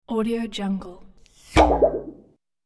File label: impact warble and bing